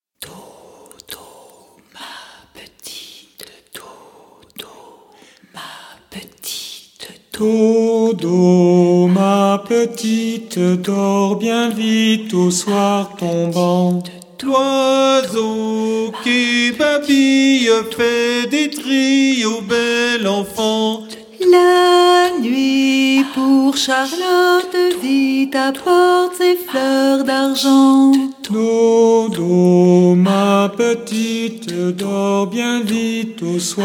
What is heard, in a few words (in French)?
Berceuse pour Charlotte canon harmonique ostinato